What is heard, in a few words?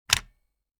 tape recorder eject